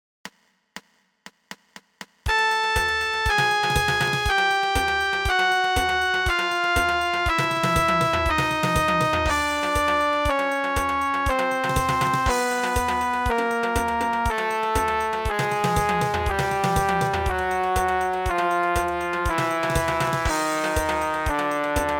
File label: mouthpiece buzz